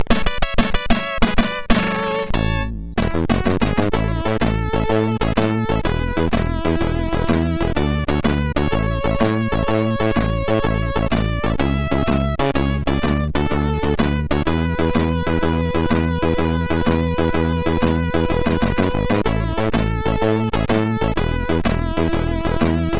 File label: hip pop, MOS6581R4